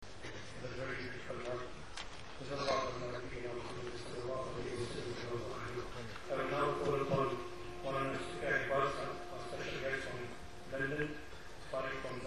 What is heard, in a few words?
Naat Maulana from South Africa